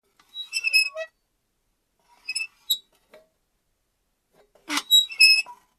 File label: Metal Creak